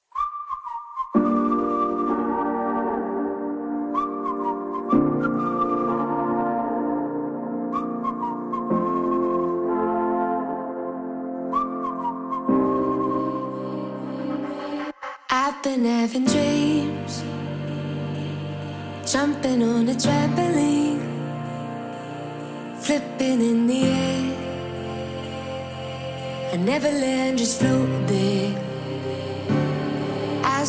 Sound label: remix bass boosted